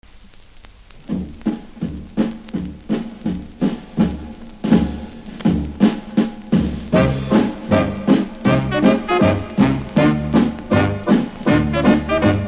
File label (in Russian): Оркестр